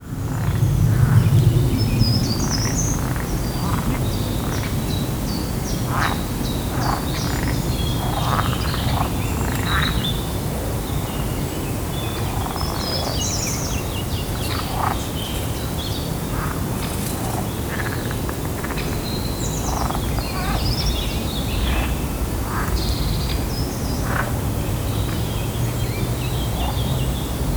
Vogelgezwitscher Wald Froschteich B

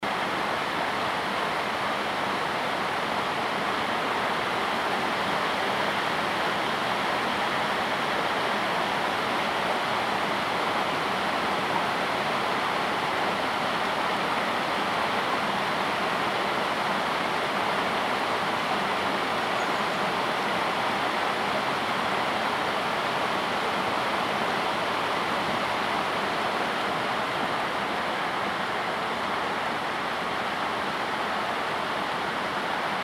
Bachrauschen; Meerbach; Filsquelle

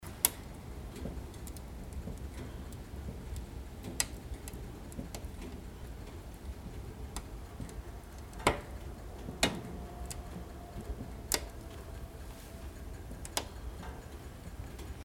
Kaminofen Feuer Ausdehnung; Feuer & Grill

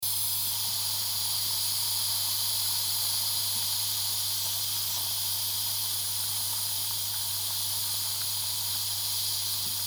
Luft Zischen; Feuer & Grill

